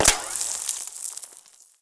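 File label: ricochet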